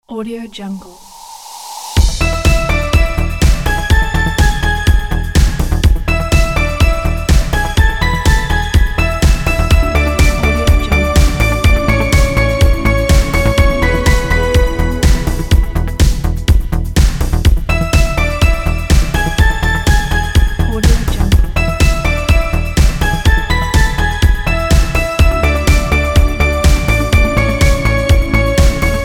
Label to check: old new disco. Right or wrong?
Right